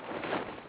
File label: bag rustle; The Elder Scrolls Travels Shadowkey; Nokia; N-GAGE